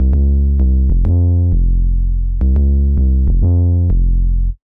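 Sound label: percussion rumble; octane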